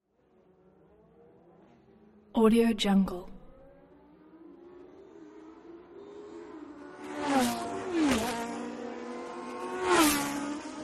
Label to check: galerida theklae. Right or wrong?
Wrong